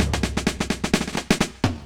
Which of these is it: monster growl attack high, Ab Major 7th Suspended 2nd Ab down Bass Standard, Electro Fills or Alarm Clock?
Electro Fills